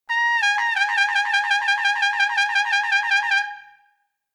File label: Shake Bb-C